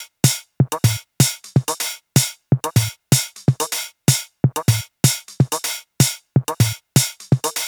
top 125

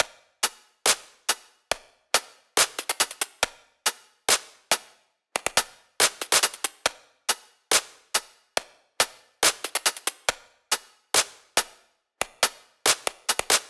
Top Loop 140bpm

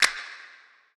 Snap Sample

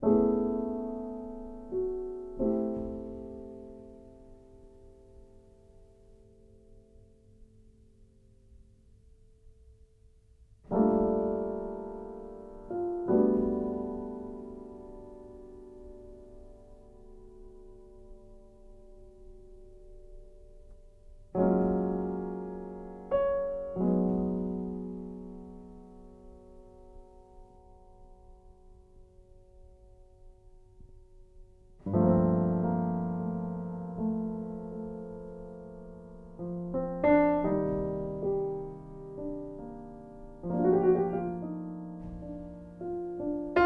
piano

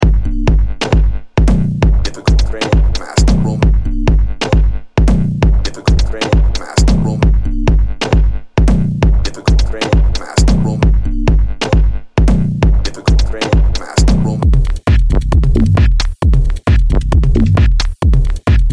Locked Grooves; TRX Premium

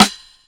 snare silky

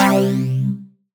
C Nu Garage Lead

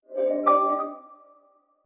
Ringing

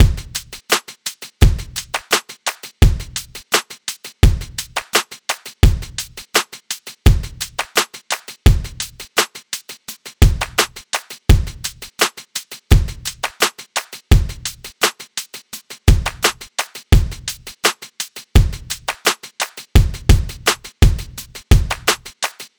drum loop water, 85

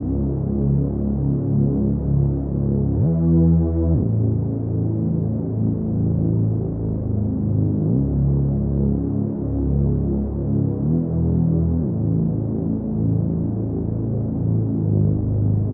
Break Reese Pad 122 C m Dry

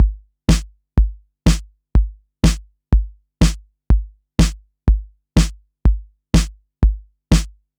123 Drums, Kicks

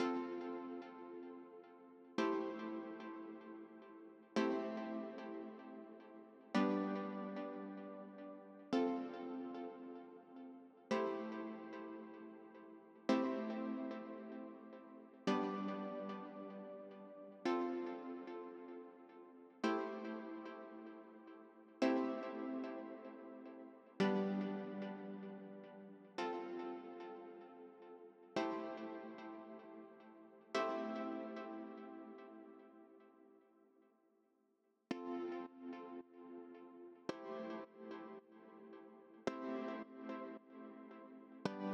Plucks

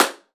clap real

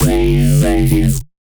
G Bass One Shot

